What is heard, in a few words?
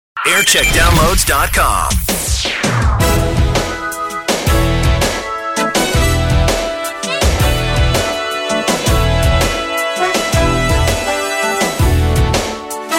Jingle Montage; BBC Radio Newcastle; TM Century; 1990s